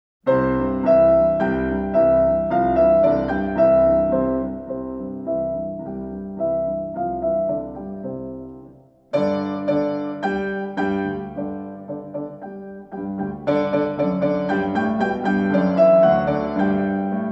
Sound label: Five Note Sonatina piano